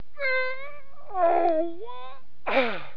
people; GRSITES Graphics

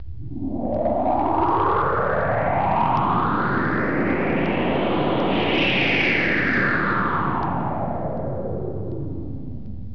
scifi; GRSITES Graphics

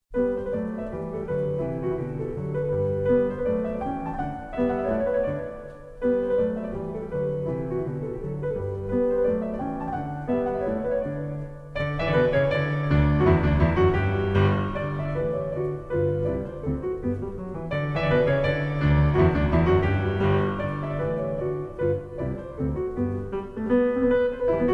Piano Sonata In G Major Vivace; Masters of the Roll, Vol. 12; Op. 79; 3rd Movement

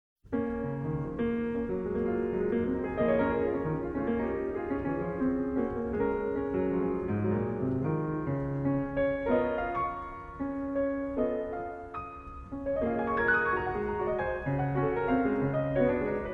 Mazurka Op. 40 No. 2, PIANO; Masters of the Roll, Vol. 09; Scriabin

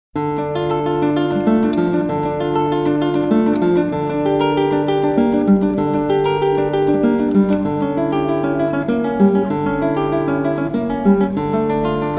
Prelude In D Minor

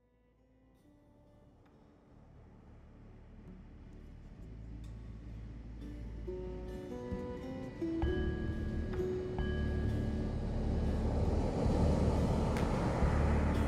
Surf Music

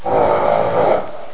farm; GRSITES Graphics

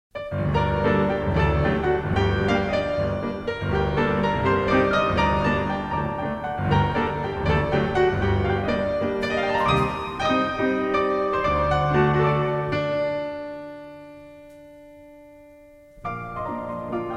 Spanish Ryhthms, Calesera, Piano Masters, Roll; Masters of the Roll, Vol. 32; Raoul Laparra

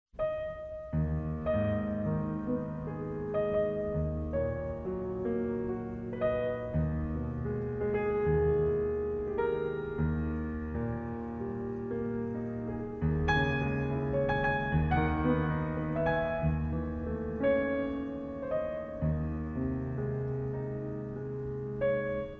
Prelude In G Sharp Minor Op. 22 No. 1, PIANO; Masters of the Roll, Vol. 09; Scriabin

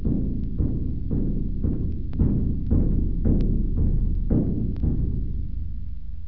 musical; GRSITES Graphics

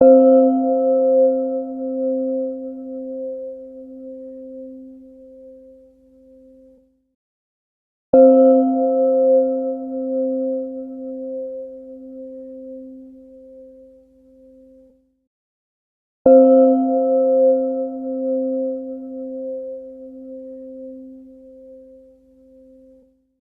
singing bowl gong x3

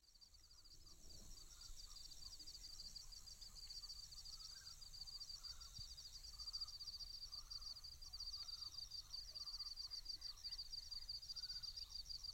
Interlude Crickets

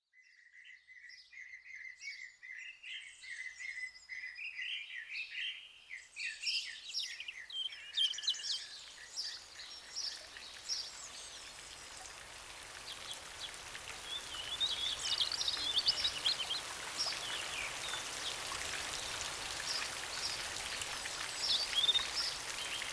Deep Forest Rain Shower With Songbirds; Relaxing Rain

